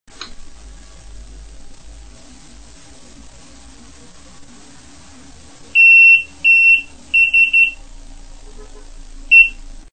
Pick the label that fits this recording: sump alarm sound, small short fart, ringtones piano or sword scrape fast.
sump alarm sound